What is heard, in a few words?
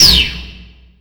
Vinyl Oneshot; Sonic Foundry (Sony Creative Software); Esoterik Beatz